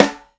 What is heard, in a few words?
Snare Crack; Airwindows